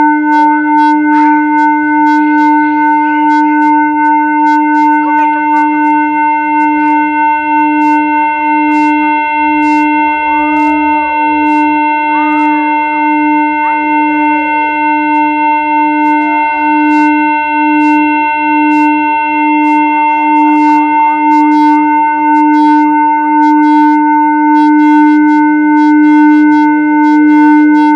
smoke machine lasers sine waves in a disused school